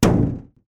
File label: hit